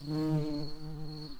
bumblebees, Pascuorum queens

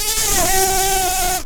Lapidarius queens, bumblebees

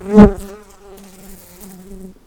Humilis workers, bumblebees

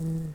Lapidarius workers, bumblebees

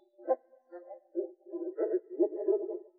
Moor Frog

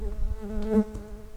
Pascuorum workers, bumblebees

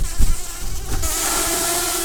bumblebees, Pascuorum queens

